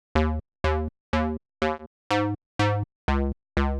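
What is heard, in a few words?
Synth bass, 123bpm, Nu Dance Electro; Combi Loops; Zero-G; Soundclash; Acidized Wavs; Italidisco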